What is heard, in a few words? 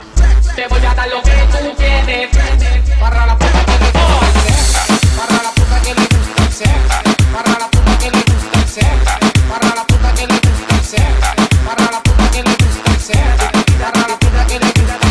ringtones reggaeton